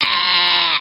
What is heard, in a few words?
grunt; Krunch